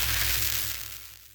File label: tesla gunshot; space-exploration 0.5.58; factoriomods